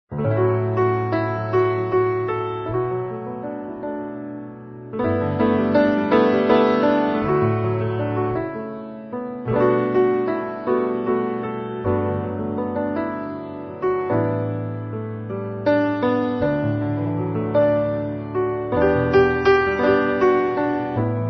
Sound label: piano solo